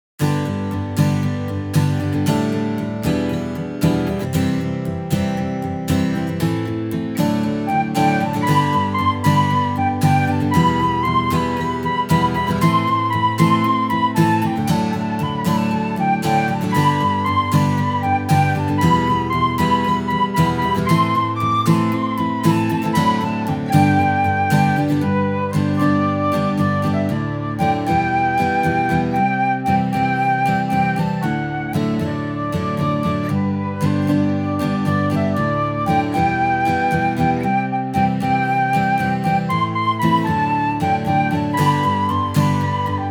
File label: INSTR